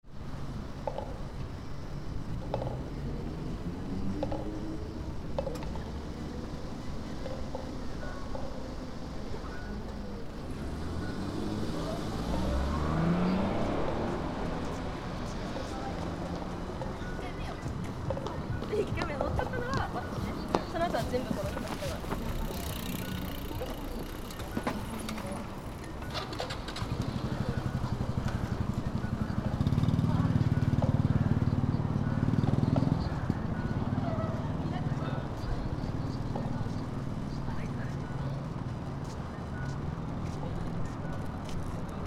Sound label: machinaka, Fukushima Soundscape: Machi-naka Park; Machi-naka Square: 11 March, 2025; In the early afternoon, 14 years after the quake, preparations for an event in memory of the victims of the quake; Koji Nagahata